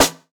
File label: Snare, jungle breaks drum sounds